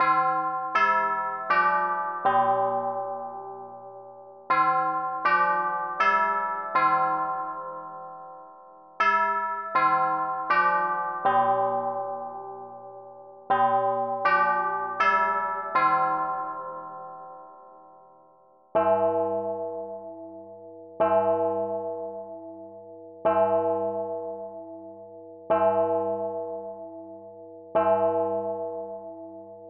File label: Westminster Chimes